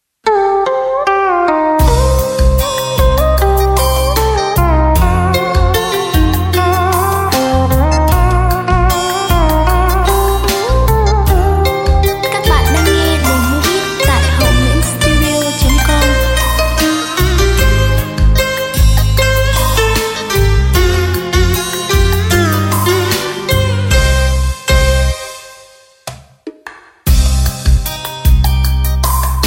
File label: beat, playback